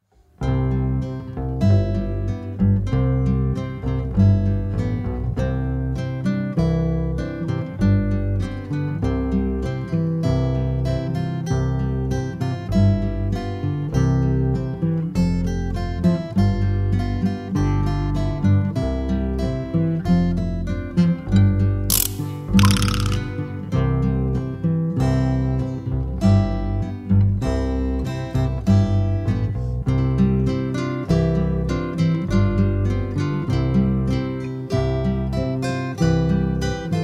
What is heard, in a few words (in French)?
un escargot s'en allait à l'école, instrumental